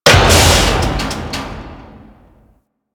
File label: Grineer Elevator Stop; Warframe; Doors; Generic Elevator